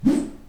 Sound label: swosh